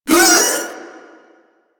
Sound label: Proto Infested vocal short attack